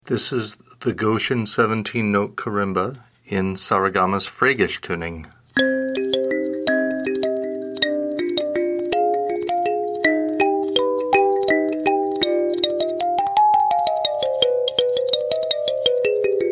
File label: karimba freygish; goshen